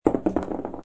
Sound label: dice roll